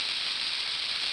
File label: Bomb Fuse; Zelda; OOT